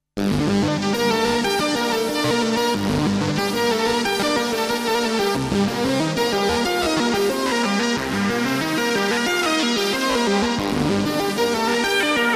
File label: MaxiKorg; Korg 800DV